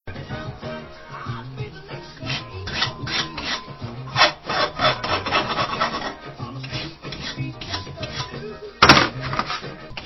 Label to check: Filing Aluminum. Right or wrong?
Right